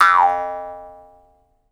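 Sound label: Jaw Harps, Pitched Percussion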